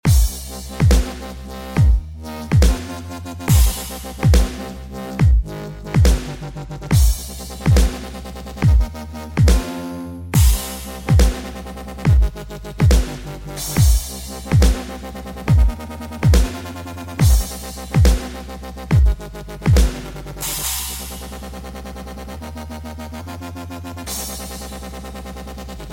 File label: Wobbler Remix